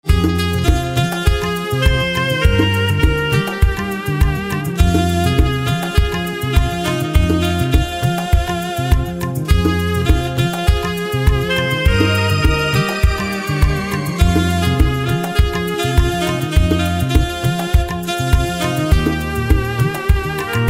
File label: Saxophone